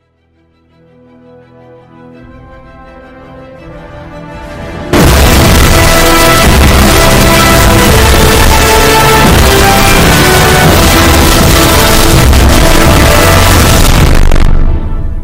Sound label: theme song earrape; avengers